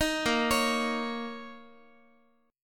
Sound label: A sharp Minor Double Flat 5th, A sharp, down, Guitar; A#mbb5 arpeggiated; JGuitar